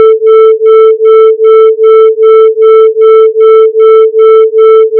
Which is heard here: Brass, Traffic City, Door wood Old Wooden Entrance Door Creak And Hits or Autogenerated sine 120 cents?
Autogenerated sine 120 cents